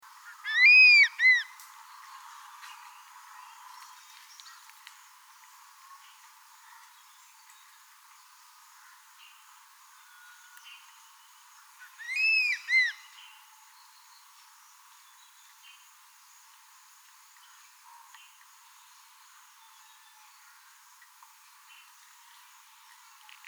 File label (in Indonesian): elang ular bido